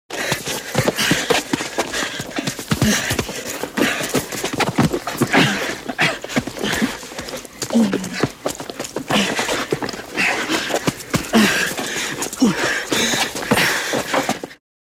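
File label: fight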